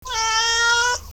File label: CAT; cubase